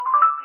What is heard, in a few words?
Call connect